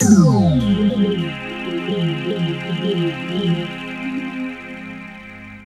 pads C; Zero-G; Total Drum Bass

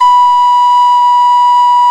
SOP H 3, MOECK; SoundBytes - Early Patches

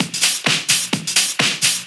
Discohouse Loop 128 BPM

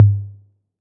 tom, Roland TR-808; Roland MV8800; TR-808mk2

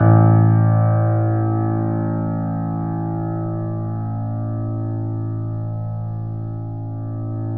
3D Pianos Yamaha C7; E-MU Producer Series Vol. 5

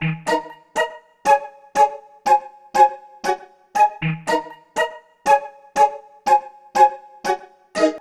Keyboards

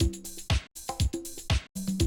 120, Classic Drum Loops

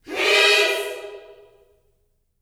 PEACE CHD, Vocal Planet Gospel GOSP CHORD; Spectrasonics

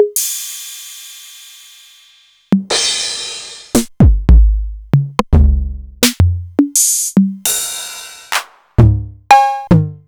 Novation Drumstation

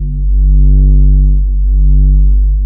MOOG BASS G2; Best Service Dream Experience

